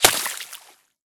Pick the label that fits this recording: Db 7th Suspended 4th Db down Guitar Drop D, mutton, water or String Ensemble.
water